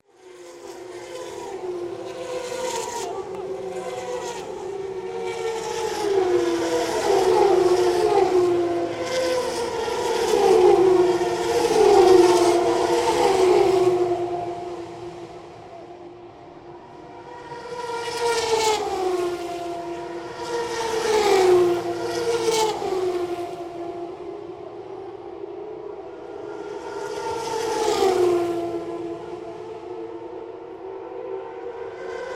autodromo; rafaela; tc

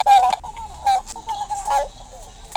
juguete cactus bailarin

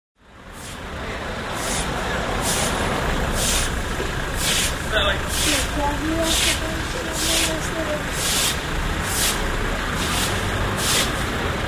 calles mujer lavando vereda, rosario; salta y ricchieri

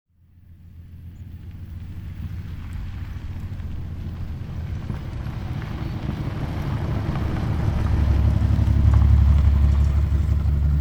calles laprade y macia ford falcon; santo tome

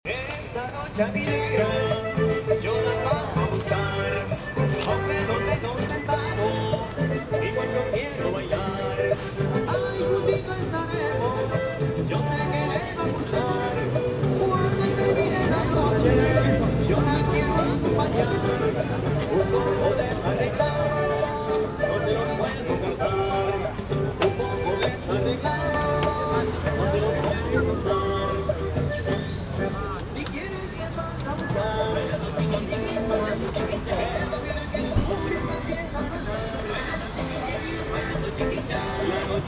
recorrido parque norte

